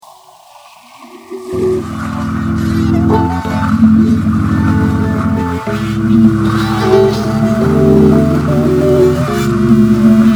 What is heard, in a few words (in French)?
reveil